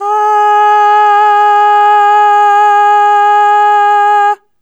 Aah Long G#3 Dry; Harmonic Building Blocks

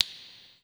snap wet; InVision Interactive; Percussion Slam

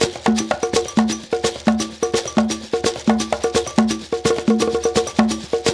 African Tap; African Contemporary; Spectrasonics; Supreme Beats

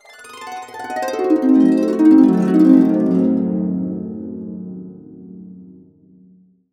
C DIM7 CA DN, CASCADE DN, Complete Harps; USB Soundscan vol.62; AKAI

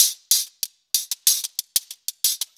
Metal Crasher, Brushes, Perc Loops; Sonic Foundry (Sony Creative Software); Drum Tools

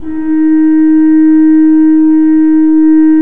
D3, Cathedral Organ Koppelflute; Propeller Island

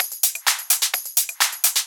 Hihat Loop 128 BPM

